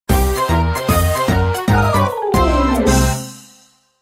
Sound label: The minigame draw theme